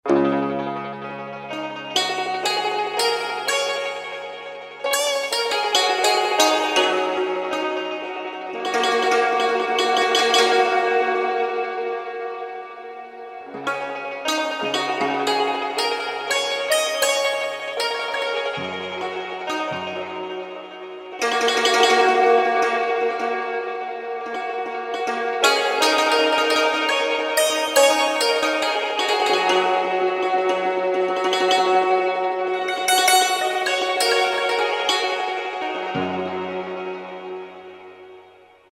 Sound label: Ricelands, Roland SR-JV80-14 World Collection Asia